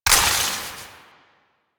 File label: Ammo Pickup Toxin; DE; Warframe; Warframes; Frumentarius; AmmoAbility